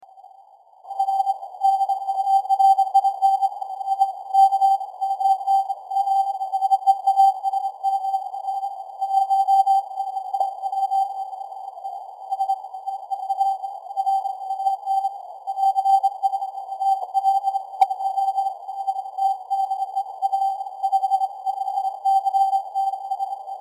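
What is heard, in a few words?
20 meter very weak CW filtered noise reduction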